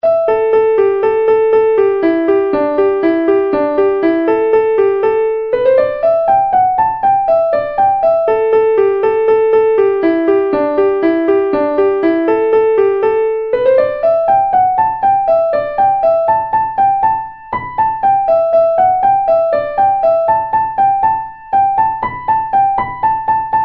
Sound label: Sandpiper